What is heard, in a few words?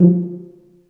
TUBA, Solo Brass, Tuba stacc